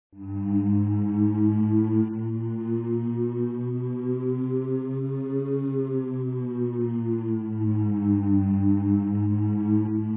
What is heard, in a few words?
drone